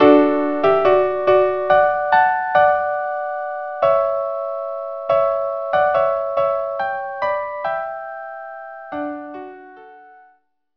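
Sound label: violin viola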